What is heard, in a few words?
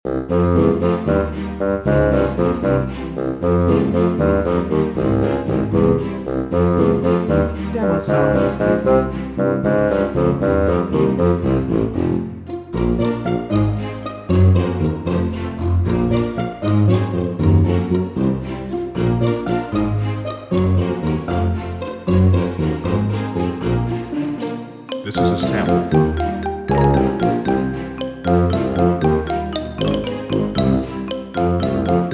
Bouncy Little Tale Loop